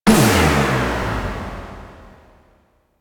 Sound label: PvP Energy Surge; DE; Warframe